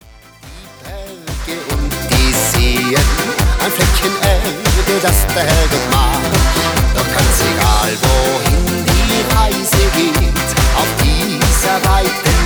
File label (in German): Party Polka Medley